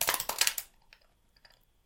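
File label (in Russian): звук бросания кубика кости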